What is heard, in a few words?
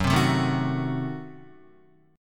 Gb Diminished 7th, down, Guitar; Gbdim7 strummed